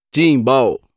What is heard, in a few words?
Timbal